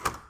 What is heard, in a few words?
VCR Click